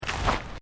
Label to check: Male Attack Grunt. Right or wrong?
Wrong